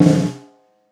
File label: Steel Short Drag, Snares; Ghosthack - Free Snares 2023